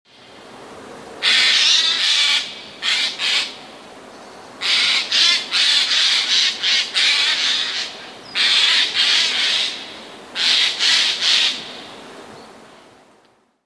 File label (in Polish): sojka